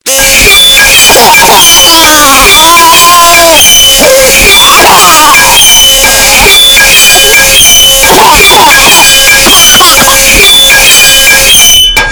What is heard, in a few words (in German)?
Klingelton